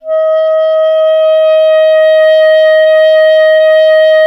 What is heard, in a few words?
clarinet D#; Miroslav Vitous; Solo Instruments VOL-1